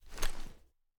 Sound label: sn ads up, weapons; viper